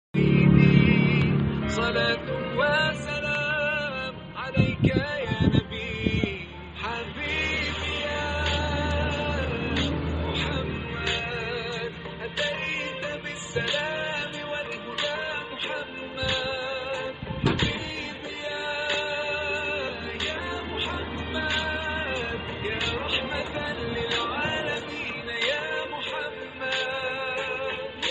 arabic

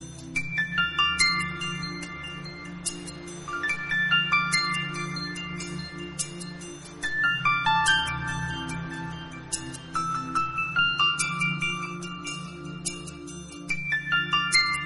soft romantic

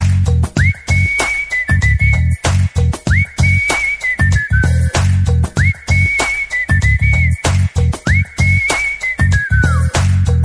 romantic whistle

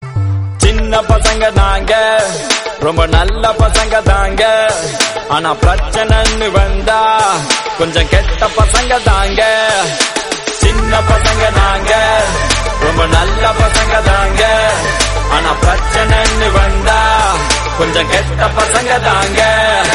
nice song

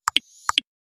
notification tones

